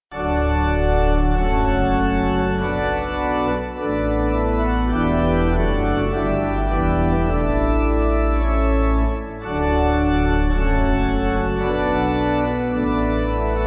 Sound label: Pipe